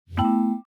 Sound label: skell jingle